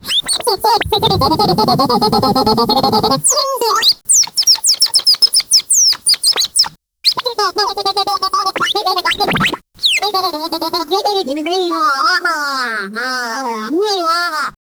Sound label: a brave cartoon chipmunk